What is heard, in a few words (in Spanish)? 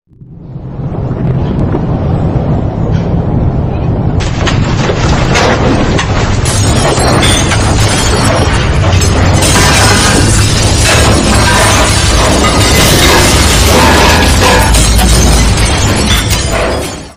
SONIDO TERREMOTO